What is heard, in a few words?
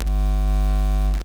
Noise Amp Hum; Loops; Texture; Ableton Live 12 Lite; Core Library